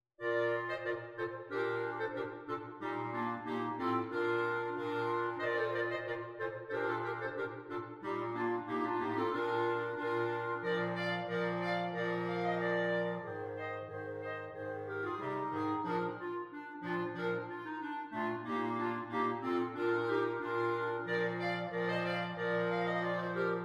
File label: Jig Clarinet Quartet; Kemps Jig